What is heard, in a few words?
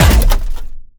steampunk mechanical metal element heavy; Cinematic Impact; Bluezone Corporation